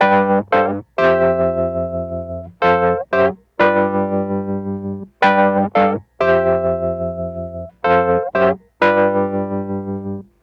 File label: Call And Response Wurly B, 70s Analog Funk; Sonic Foundry (Sony Creative Software); What it Is!